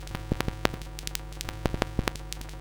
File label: Vinyl; Loops; Texture; Ableton Live 12 Lite; Core Library